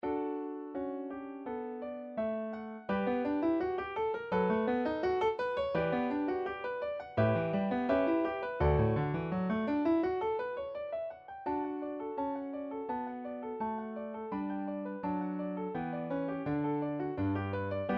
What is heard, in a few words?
natuwakinu piano